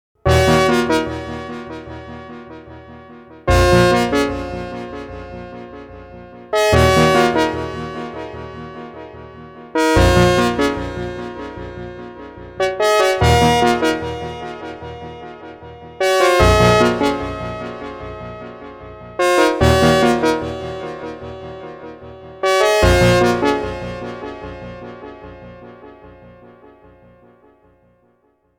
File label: polaris delay